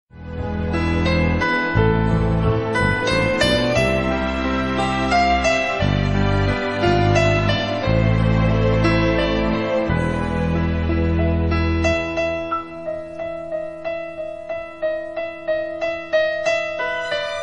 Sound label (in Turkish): Guzel piyano sesi